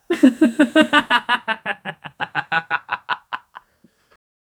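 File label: natural friendly duet laugh; adult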